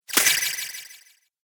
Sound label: Rpg App Game Cursed Loot, Magic Sound Effects